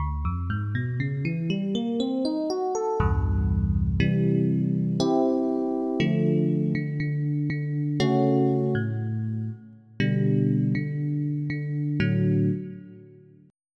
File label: E Piano 2; FF7